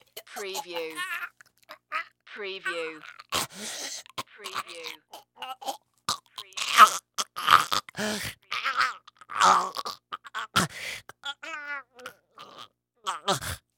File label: Choke Long Male